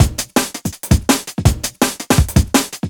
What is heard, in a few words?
165, Drum Loops; CapitalIdea; M8; Electronisounds